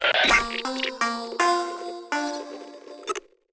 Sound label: ringtone